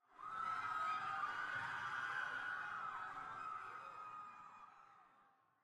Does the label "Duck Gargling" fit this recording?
No